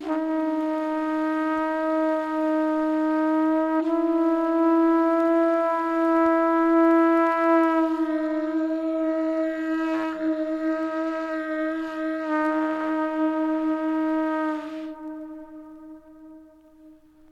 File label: horns, Sad